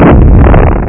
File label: Explosio; gunnygo